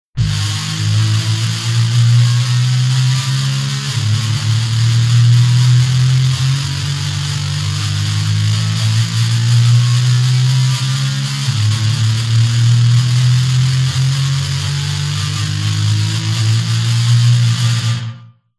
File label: racing